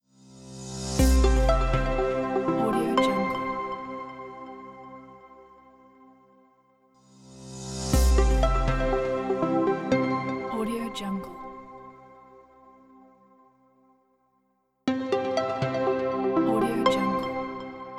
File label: modern corporate logo